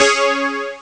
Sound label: another chord; Amiga Soundtracker Sample Packs